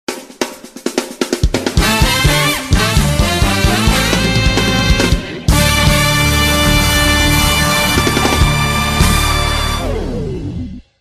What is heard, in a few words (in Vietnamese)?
Nhac chao mc mo dau chuong trinh ngan